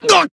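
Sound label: damaged, Japanese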